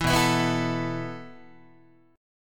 B 7th Suspended 4th B down Guitar; B7sus4; strummed; JGuitar

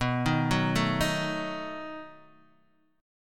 B 7th B down Guitar Open D

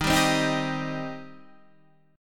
Eb 6th Eb down Guitar Standard

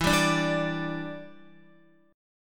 E Minor 7th, down, Guitar; strummed

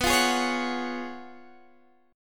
Gb Major 7th Suspended 2nd Suspended 4th B, chord

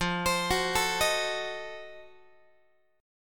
F 7th Flat 9th F down Guitar; F7b9 arpeggiated; x,13,15,16,13,14

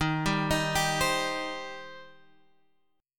C Minor D sharp

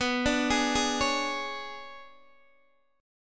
B Minor 6th Add 9th B, Guitar Open D

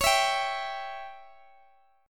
C sharp Diminished C sharp

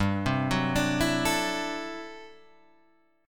D 9th G down Guitar Standard; arpeggiated; JGuitar

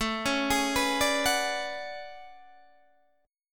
A Major 13th, Guitar DADGAD

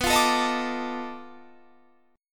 B 7th Sharp 9th Flat 5th, down

